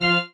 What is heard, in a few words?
marimba grave; ゆめ2っき